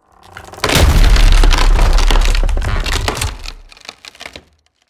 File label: hit wood, hits impacts; whooshes rises